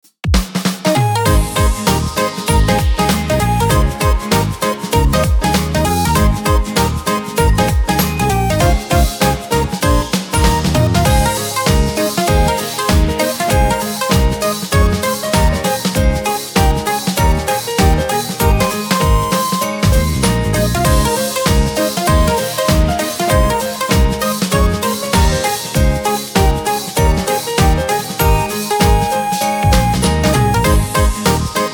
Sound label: Music Event